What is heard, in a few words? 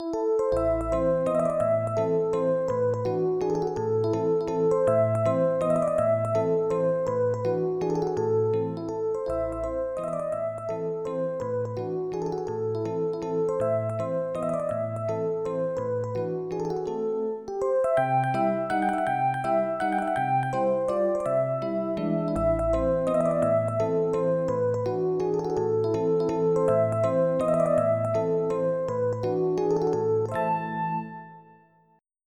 mazurkaa, waltz